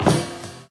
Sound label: metal grate, footsteps